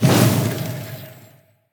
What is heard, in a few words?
Fire Spell Cancel; AudioWizards